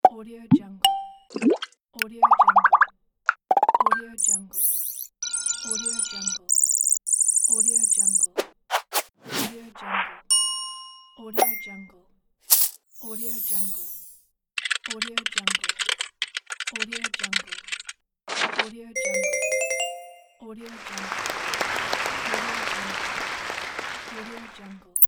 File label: corporate animation sound effects pack